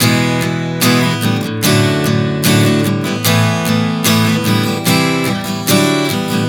Progression 074 E-D-Asus9-C-D, Acoustic Guitar, Chord Progressions 074 bpm; Sonic Foundry; Songwriter Acoustic Guitar Companion